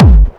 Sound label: Distortion Kicks, Bassdrums; Vengeance; Essential Clubsounds Vol.4